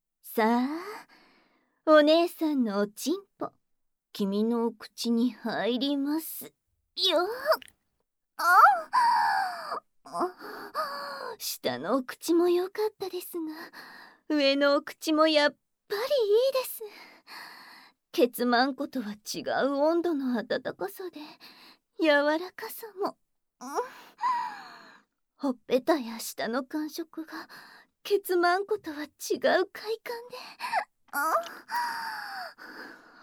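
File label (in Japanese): 効果音あり, お掃除フェラ・キス・ささやき; お掃除フェラをお願いします。; 逆お掃除フェラ・逆口内射精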